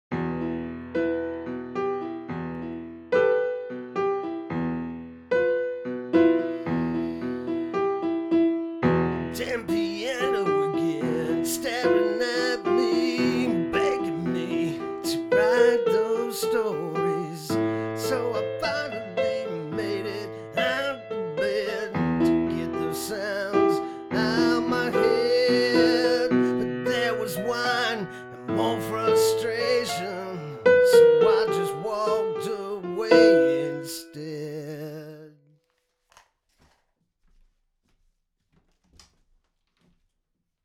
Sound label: damn piano